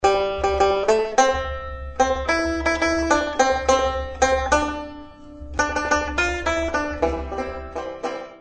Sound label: vega tenor